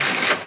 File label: gear mis